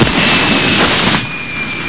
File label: ROCKET, WEAPONS